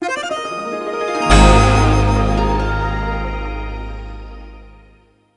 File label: Game Start; pandora's box